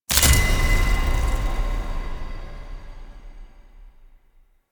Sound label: Music Fragment Collect; Warframe; Collectibles